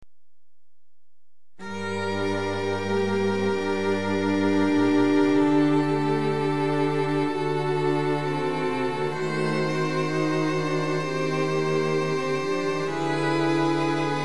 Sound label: Fl Ob Fl Fl VC, Midi; Great Light